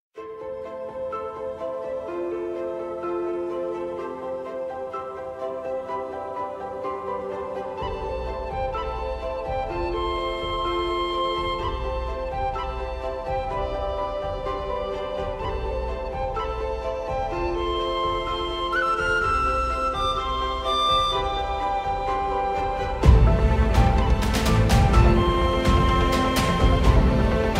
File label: MUSIC